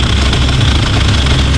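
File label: Chain Idle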